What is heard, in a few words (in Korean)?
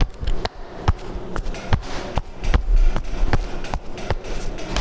걷는 소리; 김도연